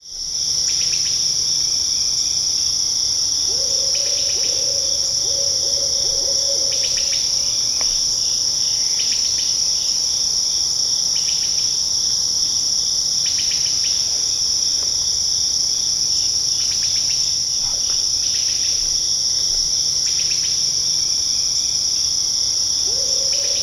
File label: nature ambience national forest illinois insects owls; shawnee; 6 30am